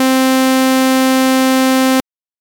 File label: sustain; Psychoakustische Grundlagen der Musik